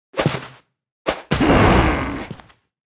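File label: Anim Classic Barbarian, 动作音效; 野蛮人; 部落冲突; 皇室战争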